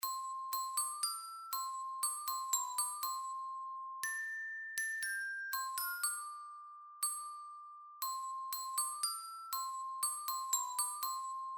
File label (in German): Melodie mit Begleitung Grundstufen Alto Glockenspiel